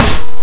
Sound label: Snare, F2; Guitar Rock Tour